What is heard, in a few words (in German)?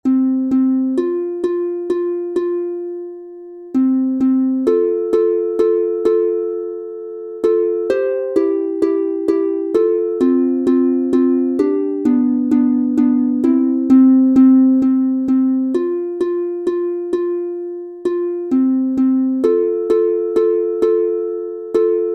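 F Dur 2 stimmig instrumental, Harfe; Kinderlieder; Trara Die Post ist da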